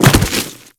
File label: body fall; Kubrow; Warframe